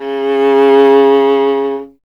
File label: viola C; Miroslav Vitous; Solo Instruments VOL-1